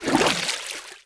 watr out

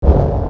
pump

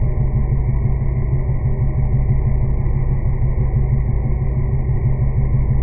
portal

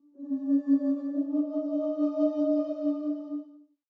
choir

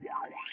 pulse

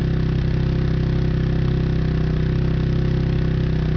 engine; xmoto

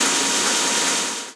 electro

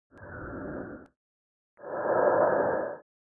evil

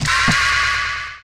jumppad

